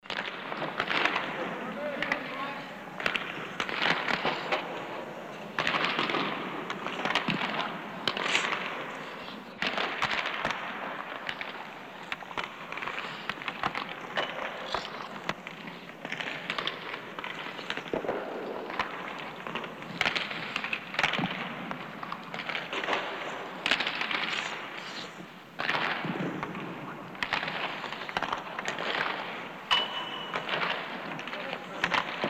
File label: shooting hockey pucks at the net